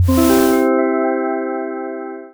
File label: book open; scripture book open